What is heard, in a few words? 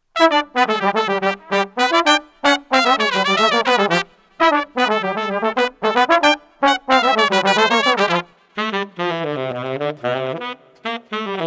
Street Brass Combo Festive no Drums 114